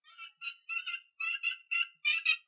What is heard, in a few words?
chicken; fauna